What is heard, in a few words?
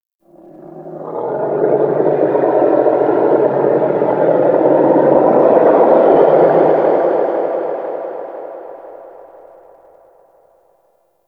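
Shipyards Distant Moan, Ambience; Warframe; Grineer Shipyards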